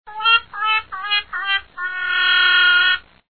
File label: mournful trumpet; Together in Jesus - First Reconciliation - Computer Fun; word snatcher